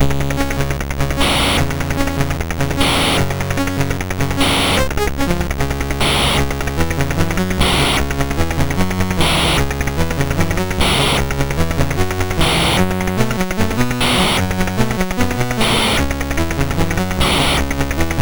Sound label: sid, MOS6581R3; Atock